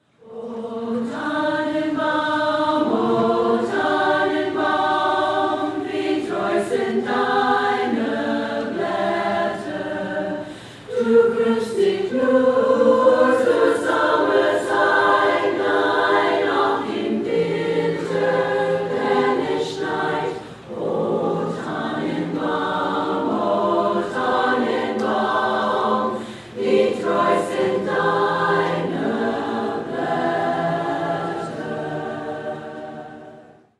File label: Chorus Christmas O Tannenbaum